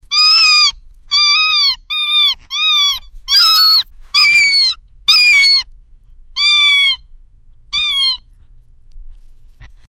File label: shocking parrot baby soun